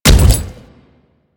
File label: Step Default